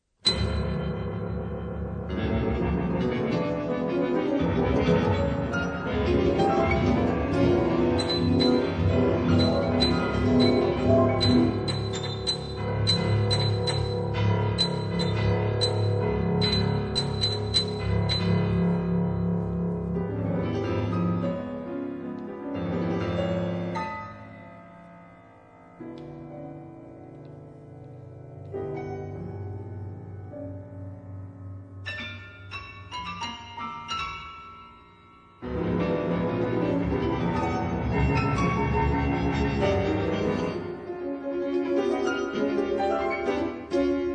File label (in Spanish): ciclos